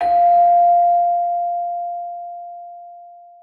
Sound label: Long Bell F